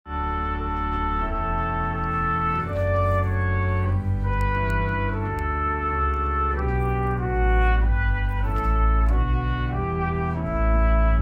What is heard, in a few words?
Prelude